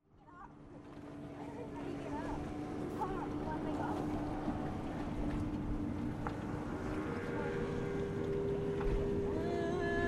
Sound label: jumping in